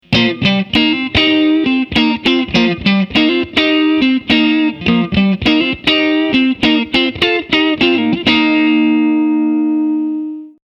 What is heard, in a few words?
Funky